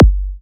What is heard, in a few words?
Electro Kicks; Vengeance - Essential Clubsounds Vol.5; Bassdrums